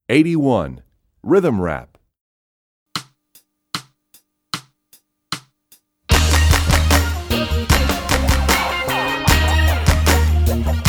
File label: Rhythm Rap, Percussion; EE2000 Book2